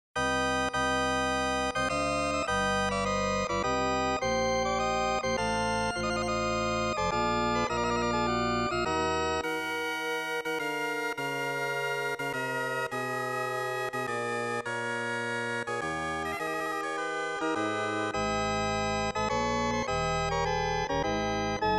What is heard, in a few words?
penny Jingle